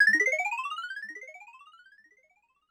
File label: Korg 01W Dance Kit; 300 Drum Machines